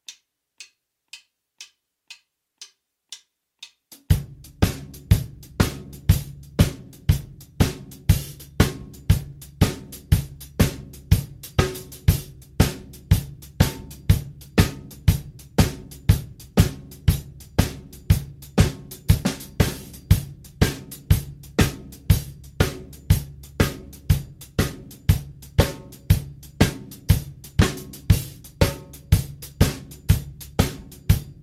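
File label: Blues Shuffle Drum Beat 120bpm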